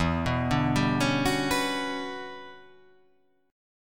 E Minor 13th chord

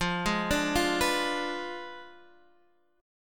F Diminished 7th F down Guitar Half Step Down

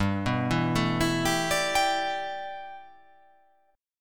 G 6th Add 9th G, chord

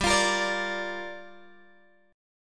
G Minor 7th, Guitar, Open G

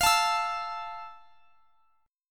F Major 7th F; strummed

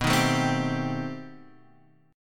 B Augmented 7th Flat 9th B; strummed; B+7b9 chord; 1,3,sharp5,b7,b9; JGuitar

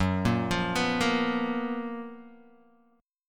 F sharp Major 11th Guitar DADGAD

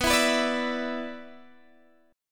B-Minor Double Flat 5th, Guitar DADGAD

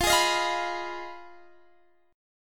F Minor 7th Flat 5th, Guitar, Drop D; x,20,18,20,18,19; down; chord shape

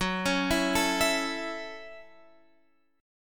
Gb Minor 7th, Guitar Open F; Gbm7; arpeggiated; x,13,13,11,12,11

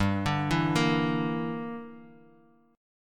E Minor 7th Flat 5th G

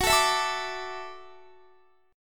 F sharp Minor 7th Sharp 5th, Guitar; strummed; x,21,21,21,21,22; 4F,4A,5C,5G,5C,6E

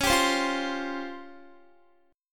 Db Major 7th Flat 5th, Guitar; strummed

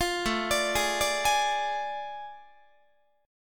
A Major 7th Suspended 4th Sharp 5th, Guitar; AM7sus4#5 arpeggiated; 20,17,17,18,18,19; 4A,4E,5A,5D,5G#,6C#

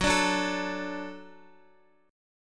Gb Minor Sharp 5th Gb down Guitar; Gbm#5; strummed; JGuitar

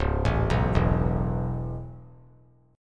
Db Minor 7th Sharp 5th Db down, chord; arpeggiated; JGuitar

